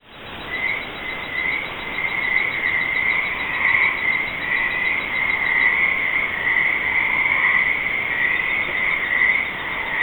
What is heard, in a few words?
Pseudacris hypochondriaca